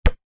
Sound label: sport basketball single light bounce